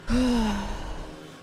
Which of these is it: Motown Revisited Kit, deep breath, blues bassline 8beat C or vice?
deep breath